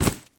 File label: armor put down